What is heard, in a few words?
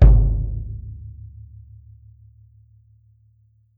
world drums, mixed drums; World Instruments